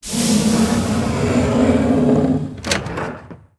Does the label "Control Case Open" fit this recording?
Yes